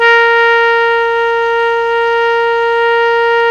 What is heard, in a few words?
TENOR PP A#4, Saxophones; Greytsounds; Philip Wolfe David Crozier's Saxophones